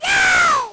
lofi sega sample; Lofi Sega Samples

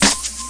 snare buzz; drums single hits

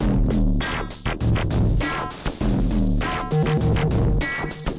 100 bpm nord beat, drum loops and breaks; 091 to 100 bpm

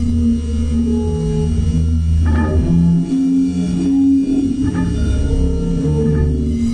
robot beast pad, looping ambience

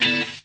guitar chord; single samples; Guitars

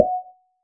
Fracture Snare; ModeAudio Found Percussion Hits

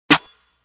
Belly Snare; drums; hip hop; STYLE KITS (Hip Hop, Etc); Khezie Hip Hop Kit

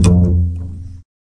Upright Bass Notes

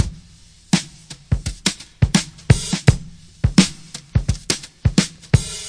brushing vinyl, drum loops and breaks, 084 bpm

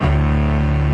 orchestra string hit D

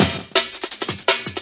hifilter rolling, drum loops and breaks, 168 bpm

